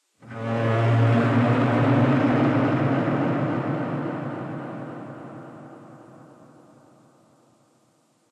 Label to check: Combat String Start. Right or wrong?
Right